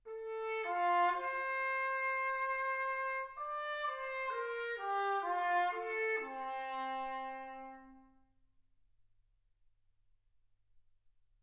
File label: trumpet